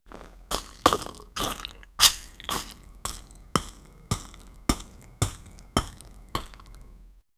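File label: foot step of polar bear, arctic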